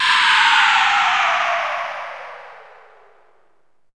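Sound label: SYNTH FALL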